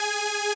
lead

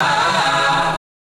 Key chant; luxembourg zwee

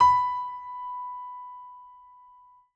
Key piano; Luxembourg Zwee

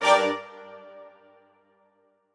strings

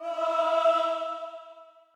choir

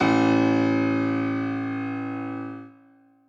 bass piano

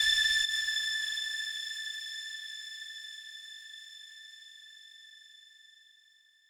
lead3

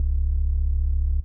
Sylenth1

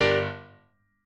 piano

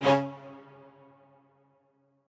strings

